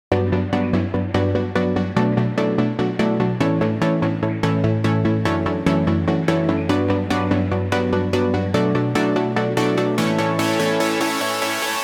Build Synths, 146bpm; Remix Stems

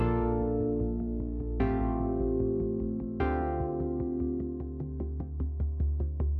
Synths

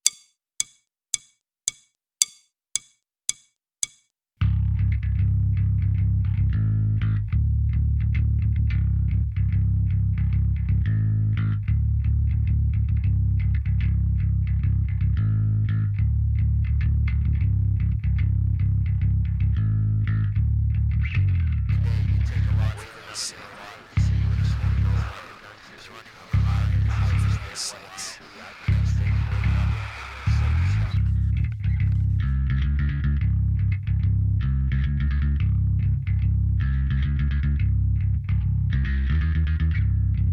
Bass Sfx Other Guitars; PowerGig

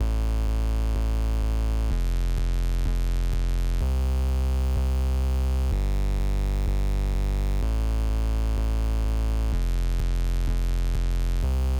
Bass Drop and Verse

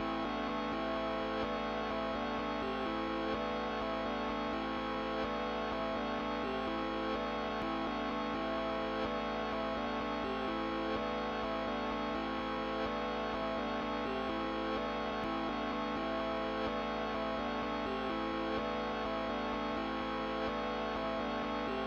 Synths Intro Chords

